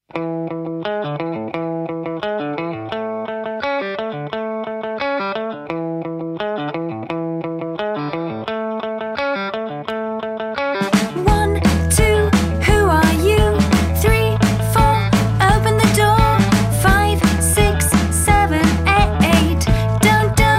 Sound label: Karaoke